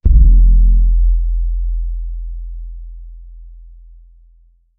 a deep boom boom boom, drum

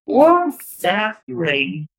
wrong answer for game; feedback

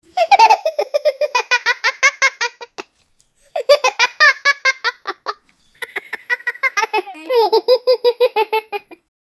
child happy laughing

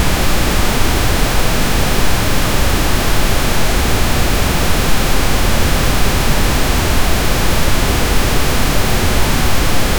pink noise generator

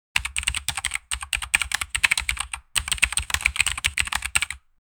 sounds mechanical keyboar, typing

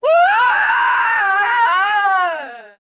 cry of despair comedy; 80s

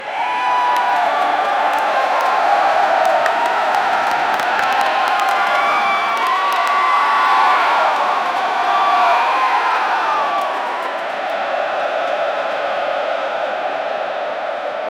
a realistic high energy s, clapping

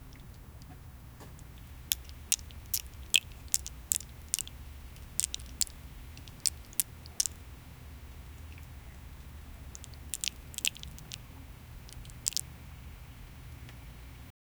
someone scaling a fish; food preparation

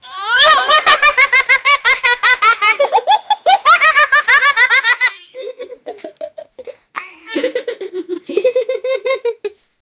laughing 7 kids; children

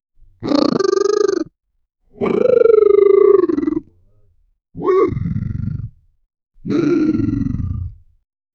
aquatic animal reptile am

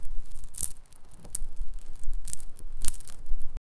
tiny crunches as the rabb, garden

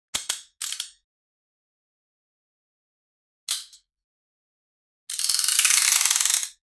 alien tool spring popping

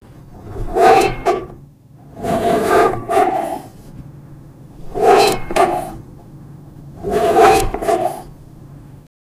sound effect of an archer

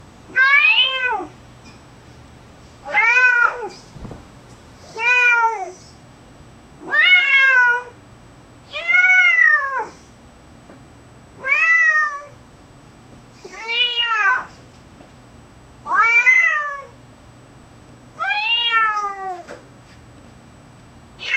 a cat after being taught; animal voice

